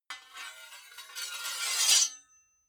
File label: sword sabre metal scrape zing